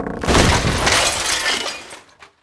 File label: collapse floor medium